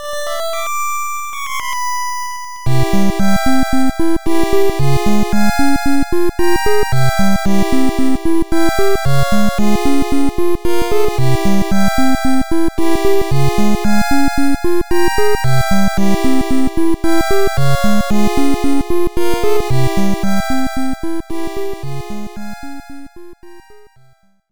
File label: whistle sound; nes; super mario bros 3; warp island